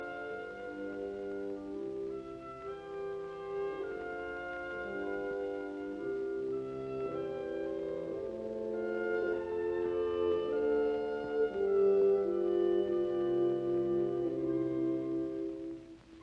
Violin Concerto in D major Adagio; Brahms; Op 77; Max Fiedler

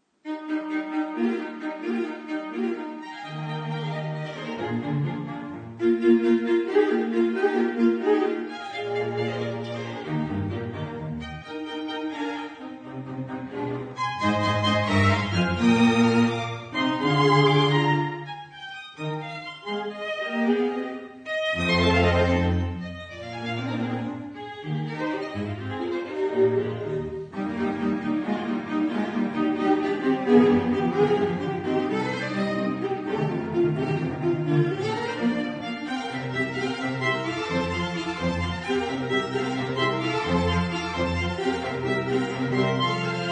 Viola Quintet in E flat Allegro di molto

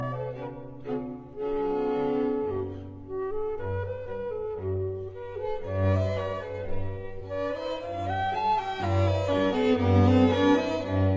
Flute Quartet in G major Tempo di menuetto; K 285a

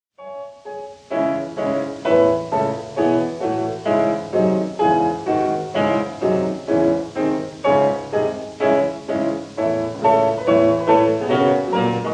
Symphonic Etudes for piano; Schumann; Op. 13

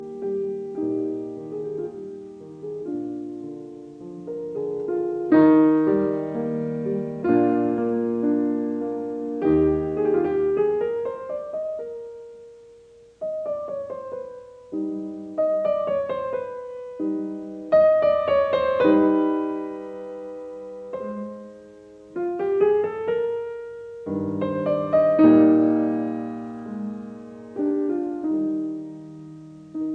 Piano Sonata No 14 in C minor K 457 II Adagio; Lili Kraus

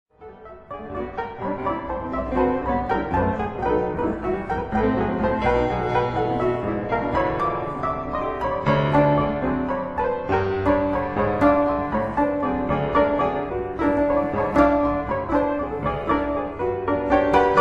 Etudes for piano in E minor

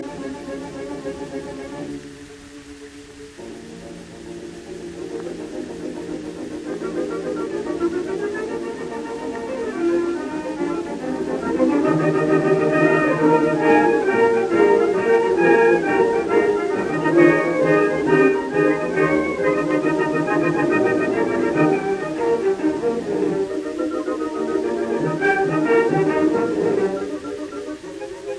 Symphony in E flat major Scherzo Allegro vivace; Beethoven; Op 55; Eroica; Oskar Fried

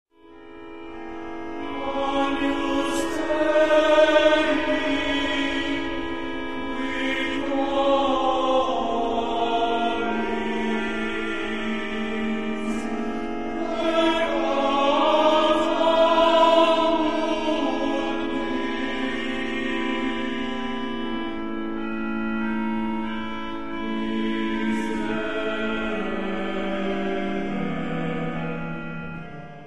for baritone, baritone chorus, orchestra & organ; Durufle; Missa 'Cum Jubilo'; Op. 11

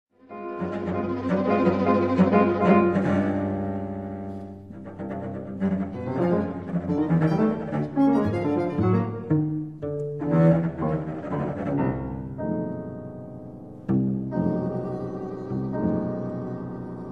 Trio for piano, violin & cello With Swing

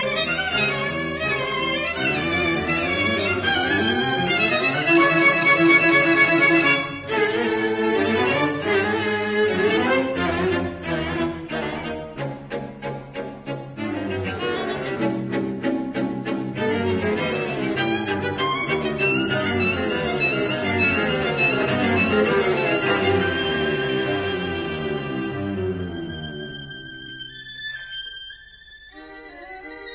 String Quartet; Hindemith; Op 22; Schnelle Achtel Sehr energisch

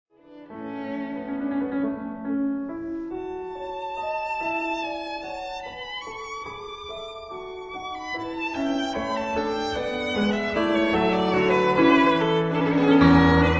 Sonata for violin & piano in A major, Allegro

